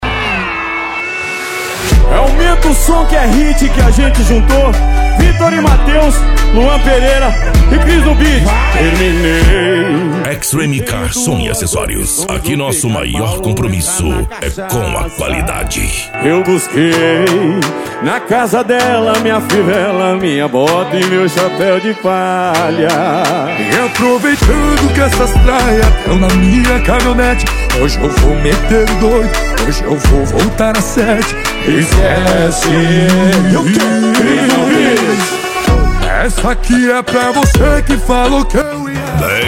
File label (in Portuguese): piseiro e sertanejo